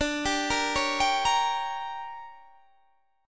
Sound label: D Major 7th Suspended 4th Sharp 5th Guitar Standard, chord; arpeggiated